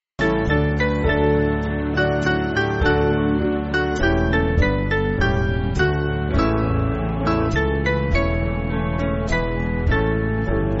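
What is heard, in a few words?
Band, Music Backing Files for Churches; Americus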